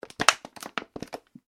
bottle plastic movement; Plastic Bottle Handling Movement; Materials; Bottle (Plastic) Movements

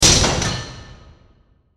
Mechanical Stamp, Mechanical, Stamp, Press; 5.1; Sound Effects:Science Fiction; BlastWave

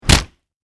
Impact Punch Face Hard; Punches and Hits; Combat

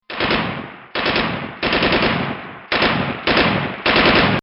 Machine Gun-Single And Double Shots; Military; Bobby Cole

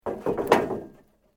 Metal Sheet Move; Material components to create more complex sounds; Materials

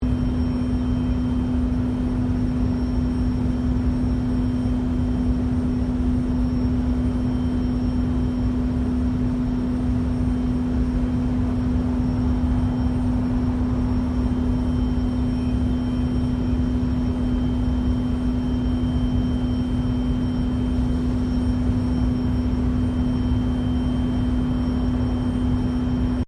Industrial Boilers Operating Occluded Loop; Hospital aiconditioning plant; Machinery (Sound Effects:Machines)

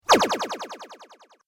Laser Zap Pulse Wobble; Laser Gun Sound Effects

